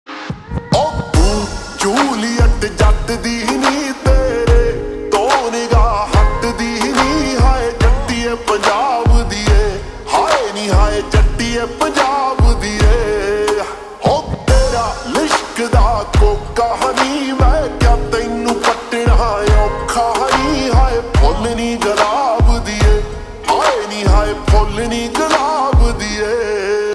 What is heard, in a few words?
Ringtone slowed reverb